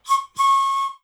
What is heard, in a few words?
train whistle